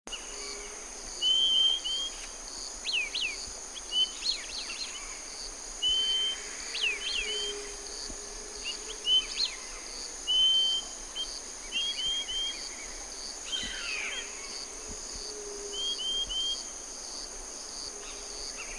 tangacomun; Tangará Común; Euphonia chlorotica; Purple- throated Euphonia

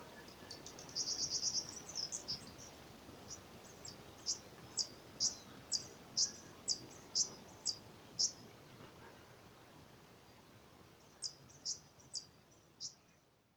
Colibrí Grande, Colibri coruscans, Sparking Violetear; cantos

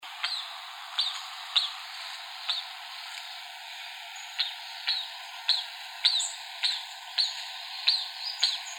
yacutinga; cantos

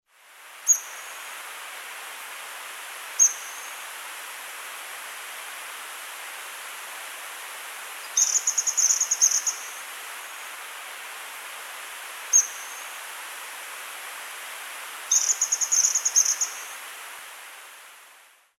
piojito guardario

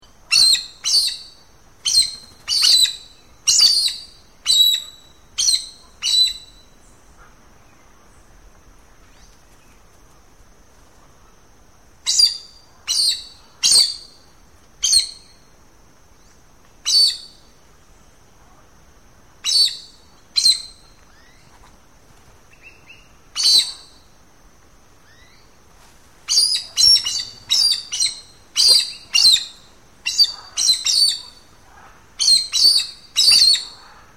arasari, Arasarí Fajado, Pteroglossus castanotis, Chestnut-eared Aracari; cantos

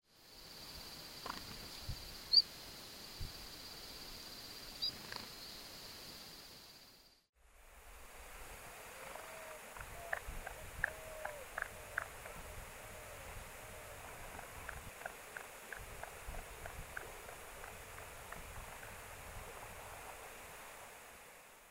yetapa

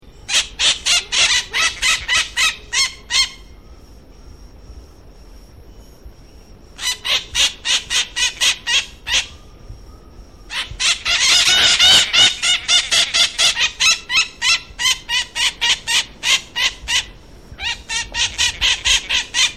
calancalaroja, Calancate Ala Roja, Aratinga leucophthalma, White-eyed Parakeet; cantos; Psittacidae

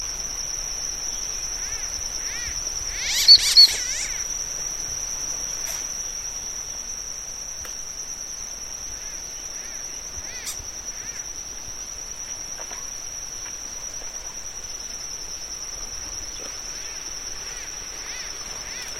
pato garga, Anas bahamensis, White- cheeked Pintail; Pato Gargantilla; cantos

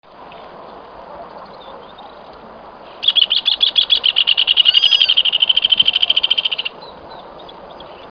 hornero; cantos

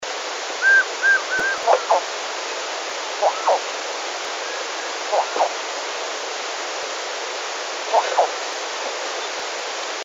Martineta Común, Eudromia elegans, Elegant Crested-Tinamou; cantos

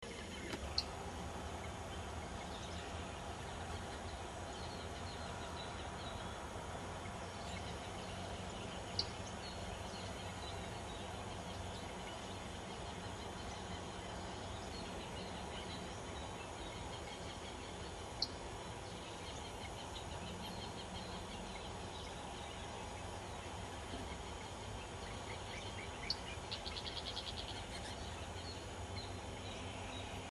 tijereta

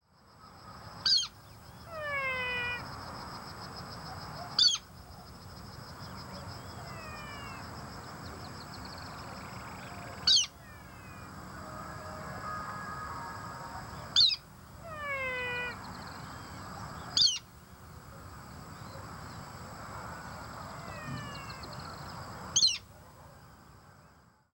galliovera; cantos

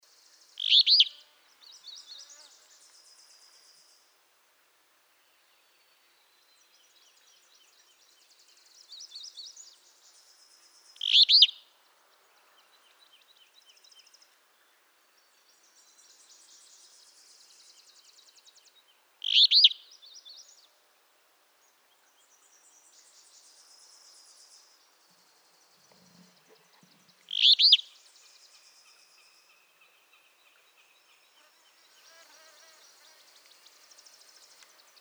Mosqueta Boreal, Empidonax alnorum, Alder flycatcher; cantos

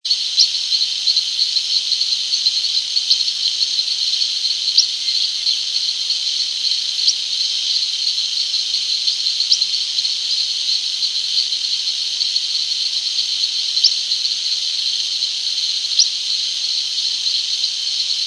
lavandera, cantos, aves

